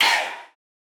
one shot vocal chant hey

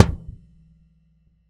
Pound Long, Kicks; Airwindows

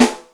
Steel, Yamaha DTXpress Acoustic Snare

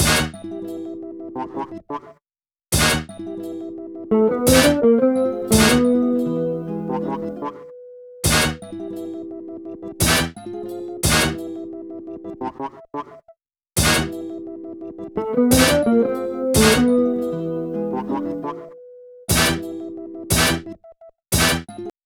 Melody, Hip-Hop

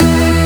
Synth Shot

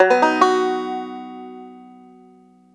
banjo arp B1; AKAI S-Series CD-ROM Sound Library VOL-2

